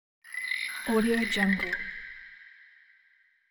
strange exotic otherworldly animal sound

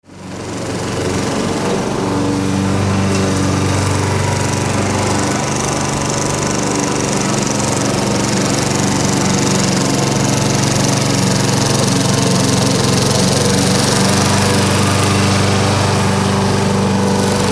lawn mower

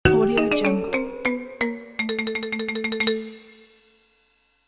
fail

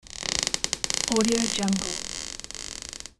squeaky floor creak on linoleum; hollywoodedge

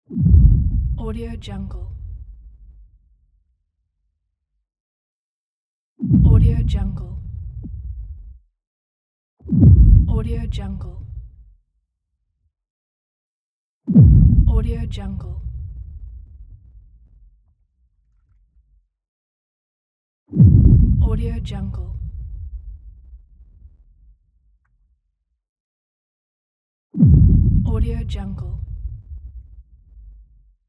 low impact deep rumble

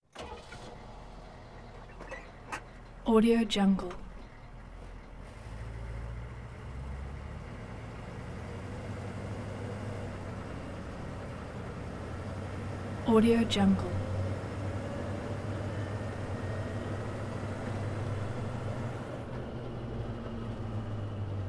1996 humvee diesel military vehicle int; prosoundfx

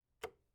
light switch wall plastic flip on off

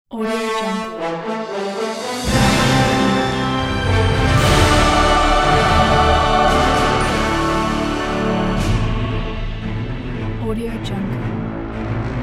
the heroic battle

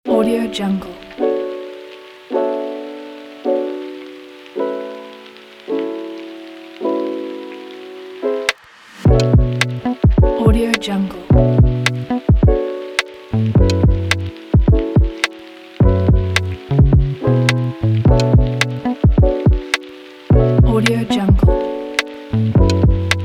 fast chill beat

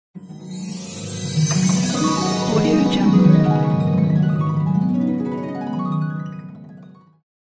magic gliss and chimes; archisound

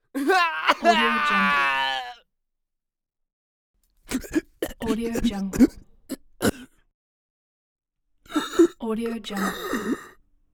dying man

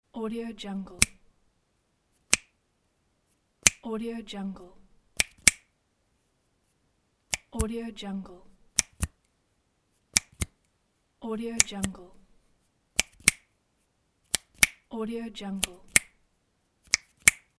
clicking

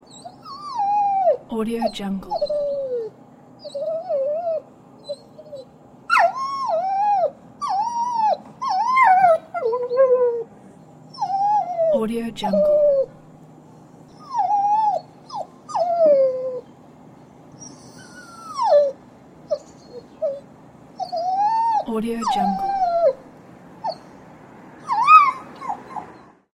dog whimpering

